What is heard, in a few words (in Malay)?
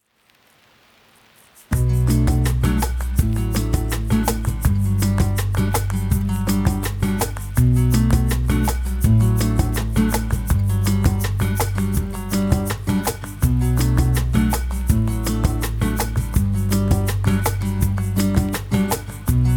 Musik Latak Belakang